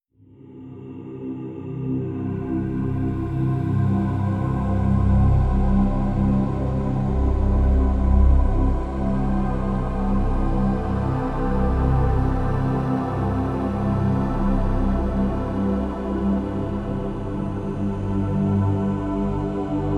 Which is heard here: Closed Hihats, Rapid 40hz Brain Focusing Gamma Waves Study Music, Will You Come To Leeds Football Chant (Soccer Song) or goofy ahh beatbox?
Rapid 40hz Brain Focusing Gamma Waves Study Music